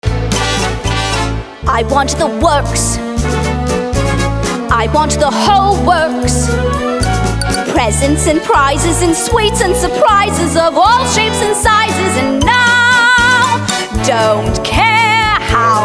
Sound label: Vocal; audition materials; Willy Wonka Jr